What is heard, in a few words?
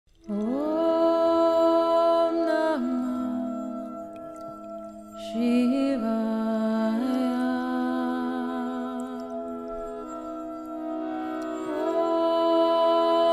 om namah shivaya